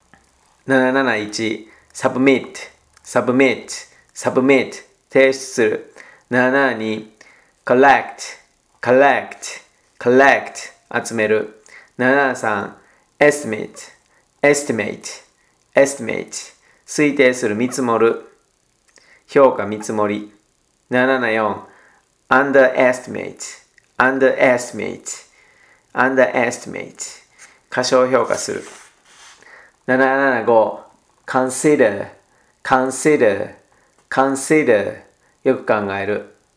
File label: tango